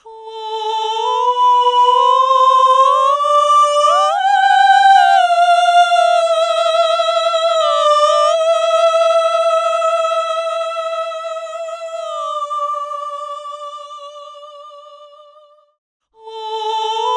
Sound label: Stereo Width Vocals - Am 120Bpm; Construction Kits; Ghosthack; SkyRealm; Kit 05 - Heart Of Fire